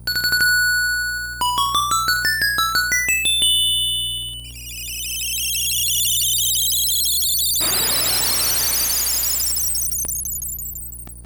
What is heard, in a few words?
Wind Marimba; links awakening original soundtrack